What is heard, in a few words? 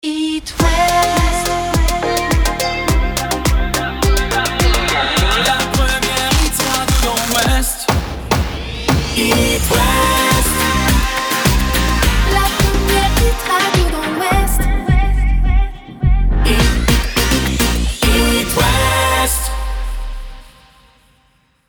Main ID; Hit West